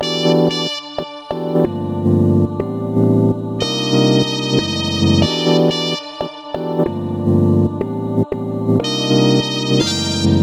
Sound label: Couch Cushions 92 bpm Melody